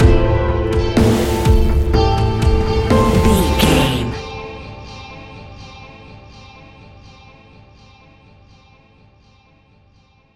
crisis point stinger, A cool piece of electronic music perfect for the nightclub